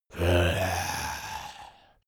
zombie moan idle

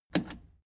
plastic button scratch smooth click disable fast

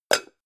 pan close hard

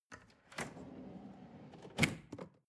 drawer large trash open hard

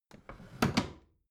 bathroom drawer close regular

